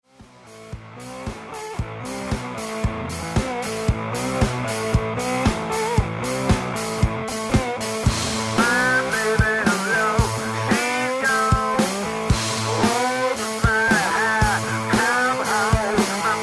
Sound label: Sexy Blues Baby Gone Blues